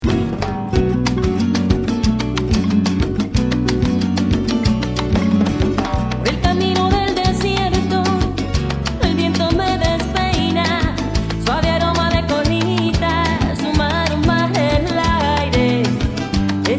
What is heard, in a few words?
latin fire